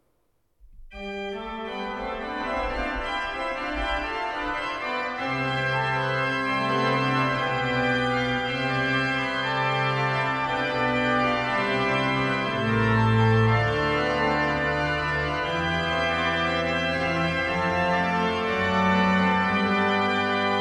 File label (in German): Orgel Praeludium C Dur; Dietrich Buxthehude; BuxWV 136